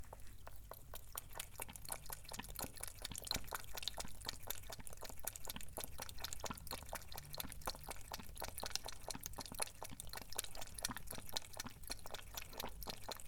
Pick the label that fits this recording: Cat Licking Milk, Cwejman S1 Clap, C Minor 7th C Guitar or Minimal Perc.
Cat Licking Milk